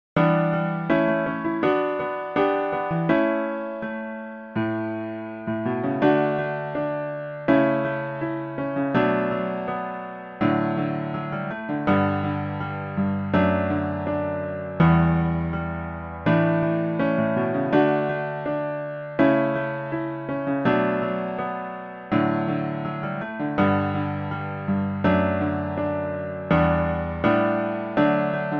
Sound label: instrumental